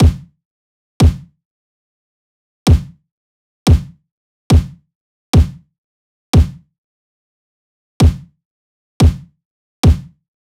kick loop 90; Pop; Drums; Loops; Slate; SD PASADENA Pop Sample Pack

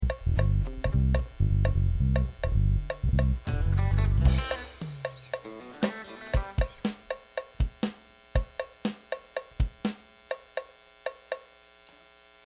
Buzz and Feedback Mix

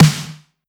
909 Snare

